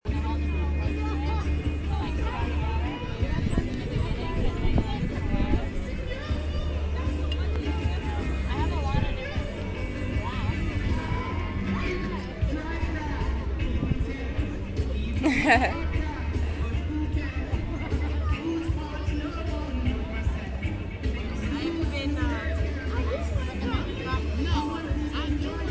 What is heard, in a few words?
reggae